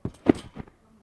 trampoline realistic

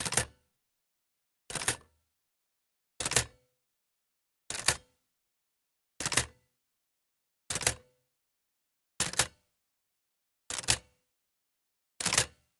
typewriter olivetti typebars mechanism fast speed; sonniss gdc

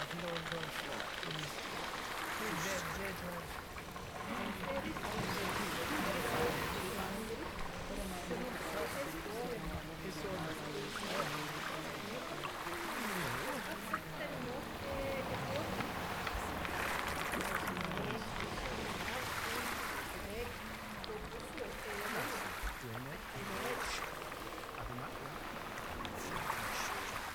lakeside dock with people, ambiences; michael ghelfi

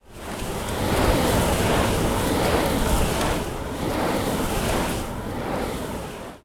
gust, cantrips

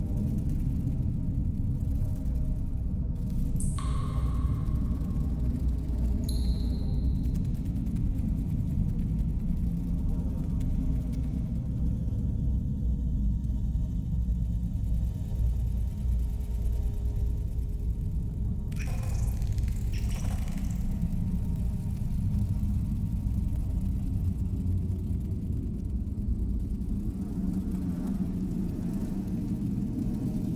spiders cave loop, ambiences; Michael Ghelfi; RPG Ambiences Vol. 6 (Short loops)

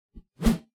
melee attack swoosh heavy, weapon swooshes; psfx

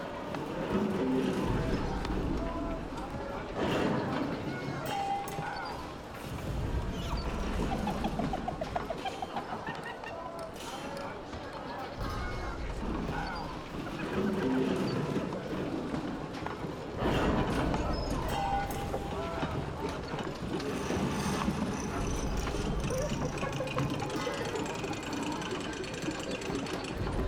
kobold junkyard village, ambiences; michael ghelfi

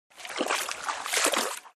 water wade; baileywiki maps premium towns

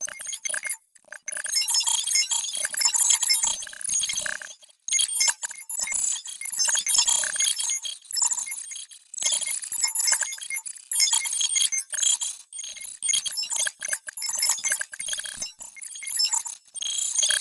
modular ui calculations and text; sonniss gdc

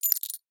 handling coins; sonniss gdc

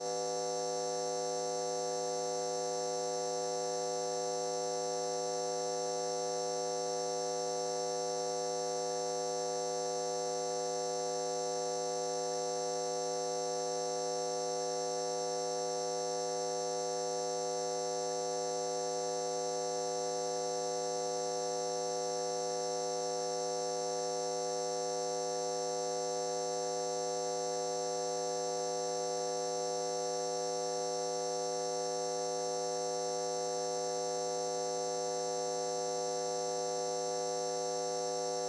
lamps designed

